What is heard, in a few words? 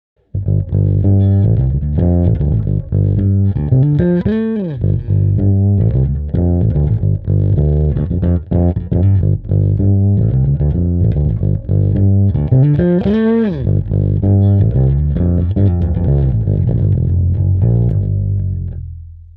BA-108v2 Bass Drive